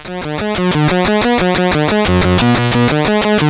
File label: sid CSG8580R5; Dungeons of Doom